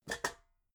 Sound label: pots rattle soft